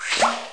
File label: PICK; McDonaldland - Silly Games; McDonald's; tictac